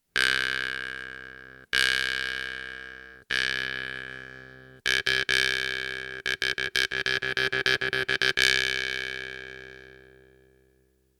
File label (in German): Zupfen Pluck